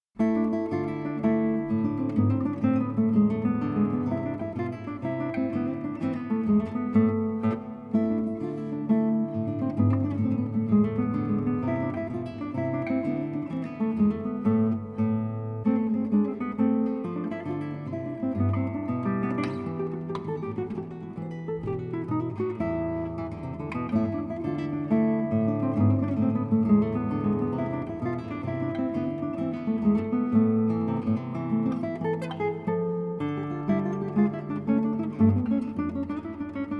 Guitar Suite in A Minor, BWV 995 Gavotta 2 in Rondeau; Johann Sebastian Bach